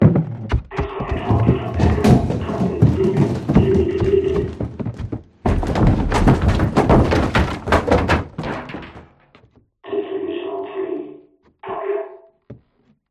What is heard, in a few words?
distant apartment fight; combine